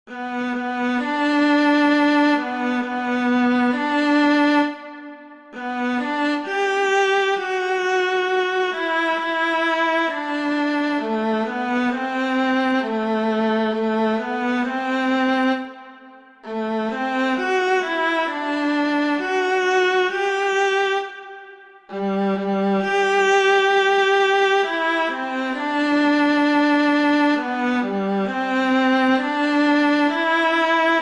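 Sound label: Brahms Lullaby, Very Easy Collection viola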